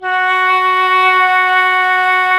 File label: FLU, Flute; Sound & Vision - Gigapack I CD 2 (Roland); Brass&Woods2